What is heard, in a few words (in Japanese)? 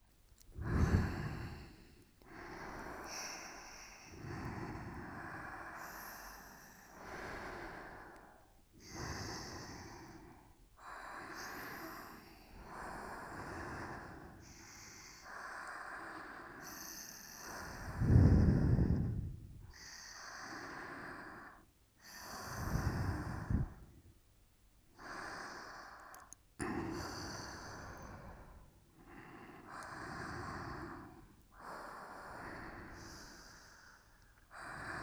全員 寝息; スキ×キス ご主人様が眠りに落ちるまで耳元で好き好きちゅっちゅしてくれるメイドさん; DL製作班; 全員Aパターン